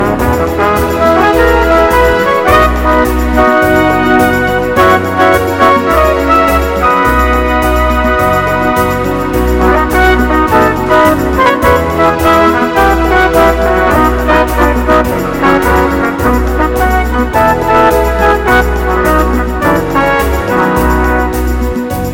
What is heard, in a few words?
A Swinging Brush Song